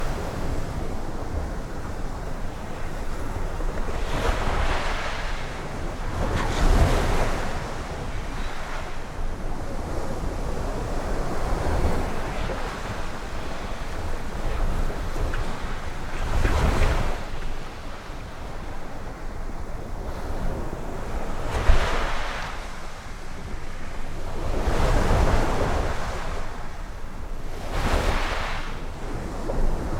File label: ambient